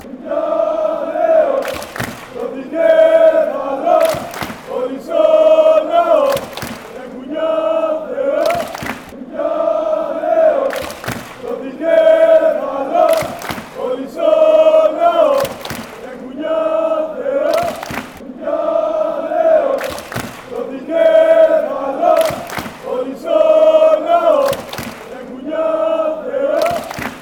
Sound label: aek athens chant